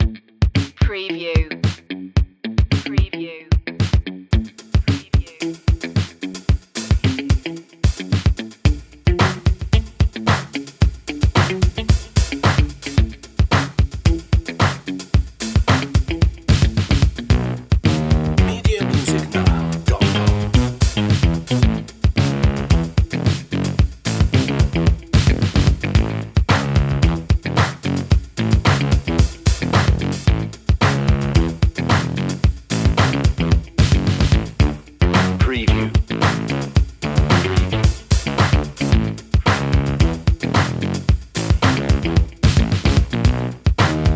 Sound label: cute n fun loop